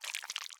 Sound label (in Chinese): 蜂蜜块滑动声